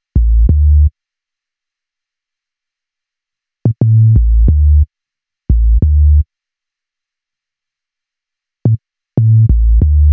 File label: Bass, Hip-Hop